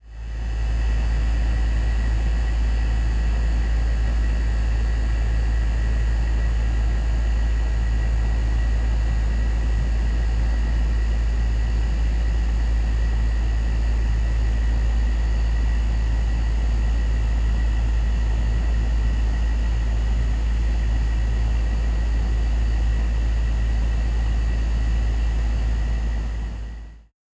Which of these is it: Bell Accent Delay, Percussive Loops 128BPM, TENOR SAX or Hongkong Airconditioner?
Hongkong Airconditioner